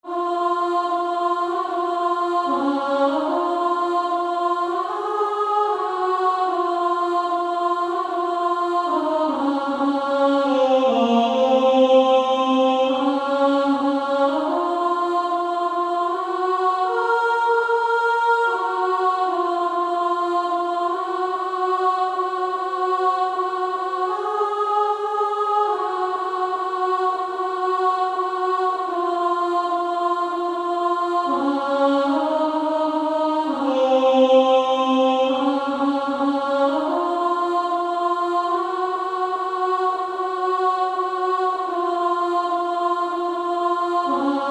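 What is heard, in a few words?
Female Choir, alto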